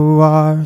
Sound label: oooarrrr; Truth-Hz - 1-Hit KO Sample Pack